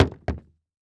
Foley Footstep Golf Cleats Slow Jump On Solid Wood Platform; Foley Footsteps